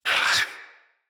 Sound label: Pain Knight Male; Mendicant